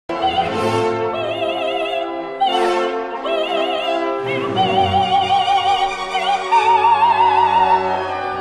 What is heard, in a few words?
Battle Cry